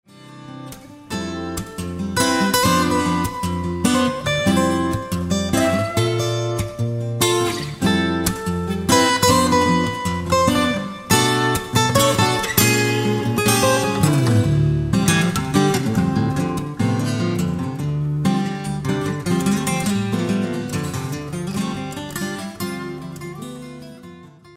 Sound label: Blue Acoustic